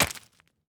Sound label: crystal cart impact